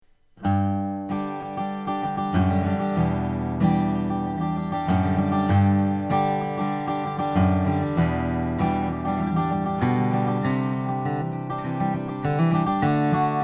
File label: rainsong co ws1000n2 graphite